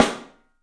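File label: PICOLO SNRS, snare, drums; 3D Sound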